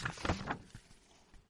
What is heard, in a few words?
page flip